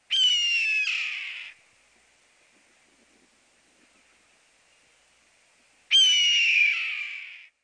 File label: red tailed hawk